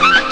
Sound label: HIT, Hits & Brass